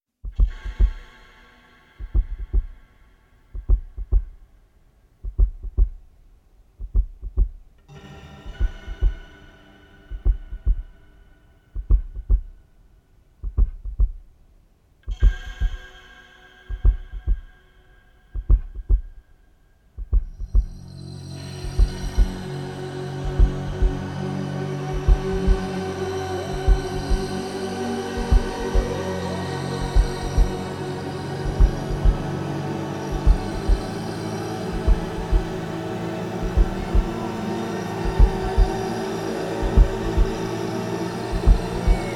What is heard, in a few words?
Roar of the Abyss